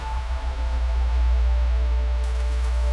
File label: water splash